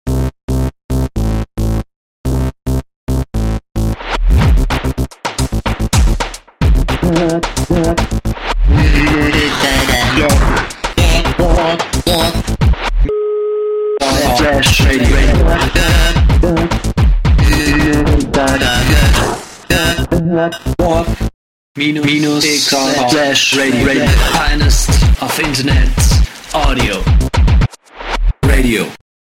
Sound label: Jingle, radio; Warp-x com